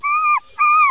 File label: gull; ambience